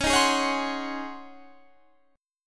C sharp Major 7th Suspended 2nd Suspended 4th, chord; C#M7sus2sus4; strummed